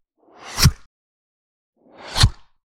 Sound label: Woosh Slap